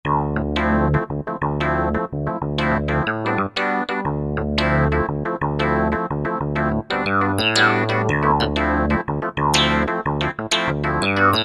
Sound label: Sticky Rez Clav, Korg TR-Rack